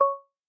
timer increment; Vivo X80-Pro

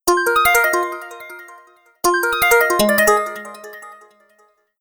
Animato tone, phonetones Samsung; Samsung SCH-M710 T Omnia II

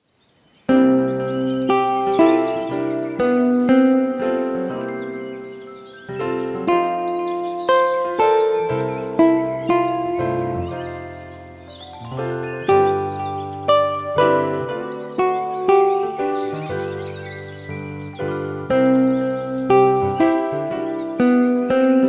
Elegant Pace, alarms

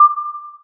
timer, Samsung SGH-i310; System Sounds

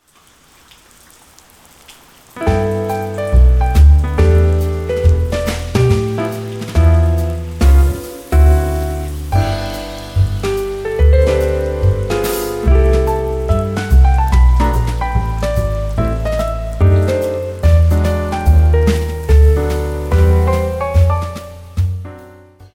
rain

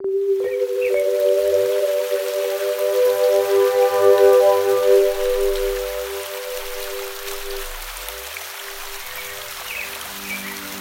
Nature trail, Natural Elements, alarms; Google; Google Sounds

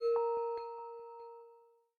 Folder Open; Samsung SM-W2018

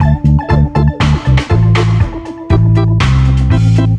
Reggae Dub, ringtones; Google; NewWave Labs

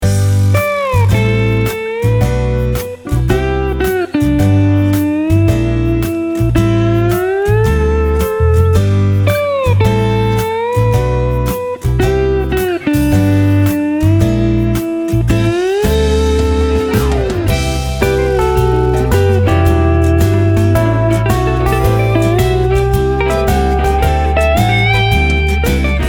Surf the Groove, ringtones; LG V31 isai VL

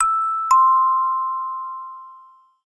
Door Chime, notifications; Fujitsu F-42A

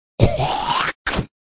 Human Coughs; Hijacked by MTV

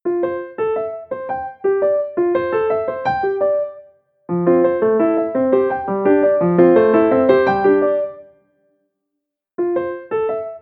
Crown, ringtones; TCL REVVL 4+

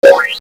brightness up; Samsung SGH-Z540

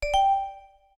Dock; Asus Zenfone-3-Deluxe

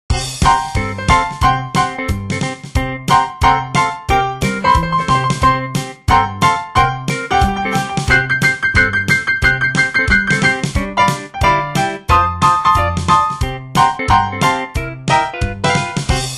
Blues

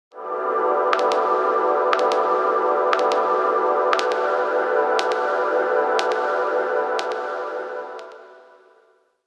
random slice

